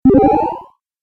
Classic Lo-fi Magic Teleport, video game sound effects